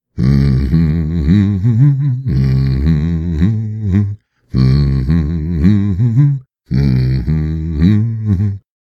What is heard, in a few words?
theme song hum; Pitch Black - A Detective's Demise